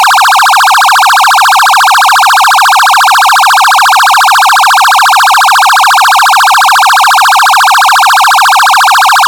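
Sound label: PIERCER, LED warning bar; UltraLITE Plus Exterior LED Warning Bar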